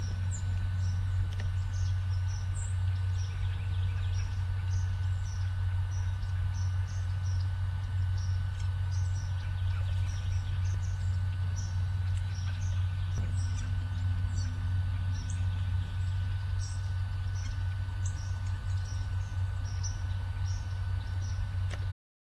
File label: woodpeckers